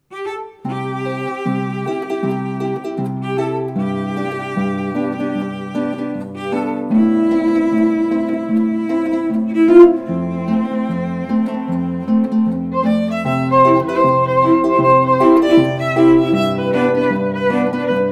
harp trio

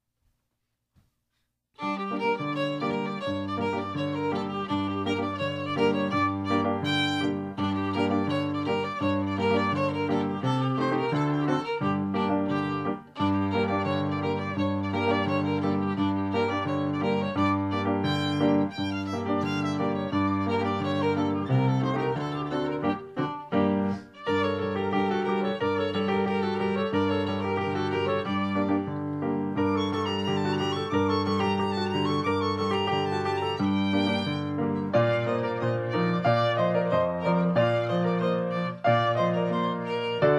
Klezmer Freilach

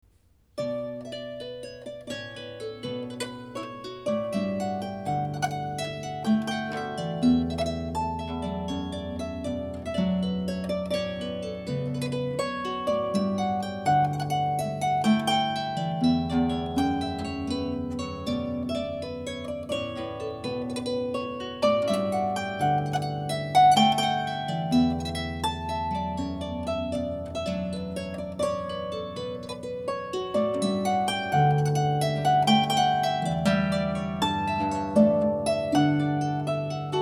harp, solo harp

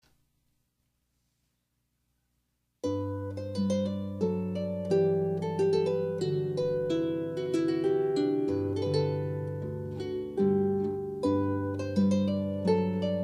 harp Hornpipe; Purcell

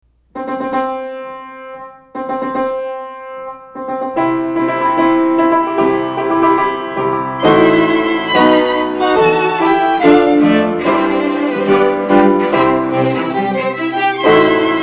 Wedding March trio

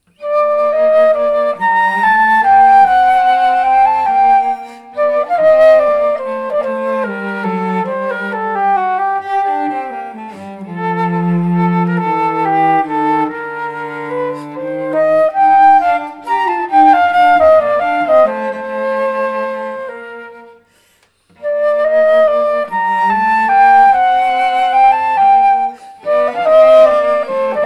cello flute minor air

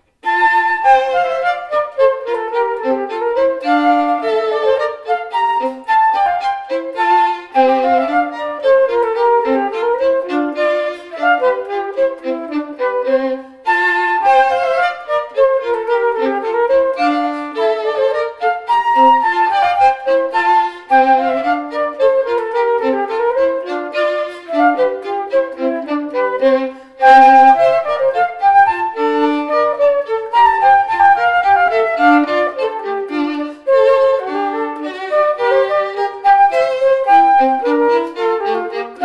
Hornpipe in F flute

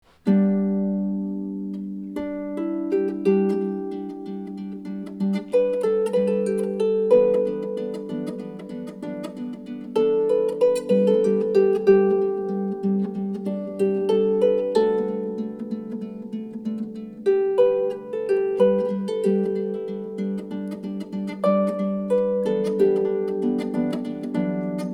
harp

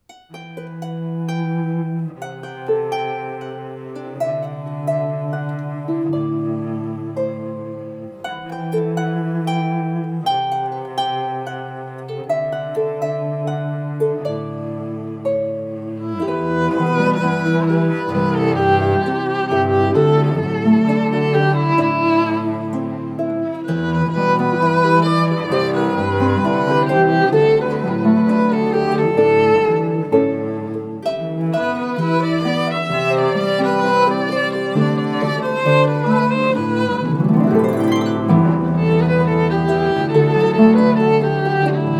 with harp

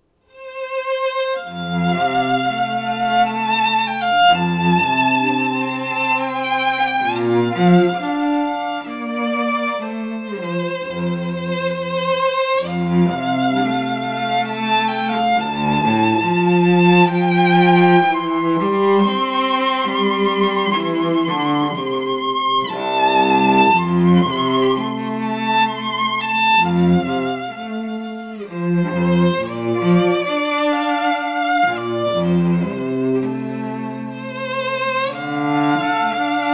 Amazing Grace string duo

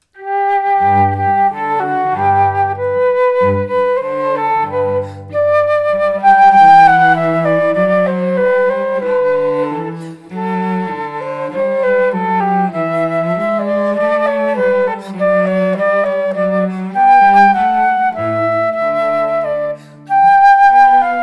cello flute; Mozart Duet in G

